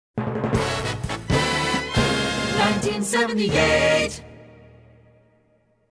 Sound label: Hits, Drops